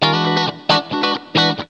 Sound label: classicfunk, guitarra; loops; sampleswap